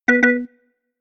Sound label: wrong